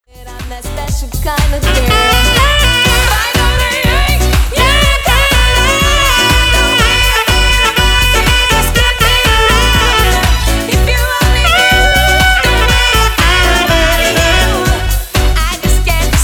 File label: Sax